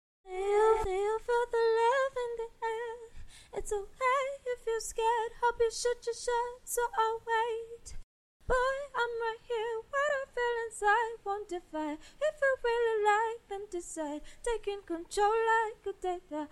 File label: rap sing male female, Acapella, 106, Am